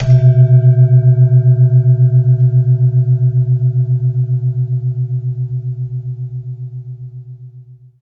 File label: Jegog